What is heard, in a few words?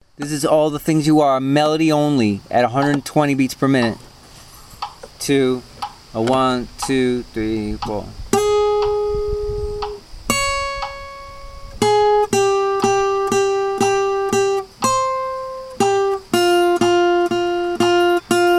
melody only 120 bpm